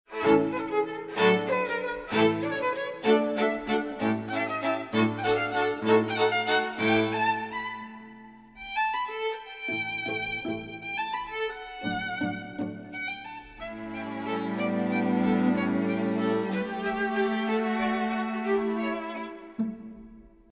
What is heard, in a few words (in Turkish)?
santral fon muzikleri